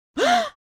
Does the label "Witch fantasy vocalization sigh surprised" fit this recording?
Yes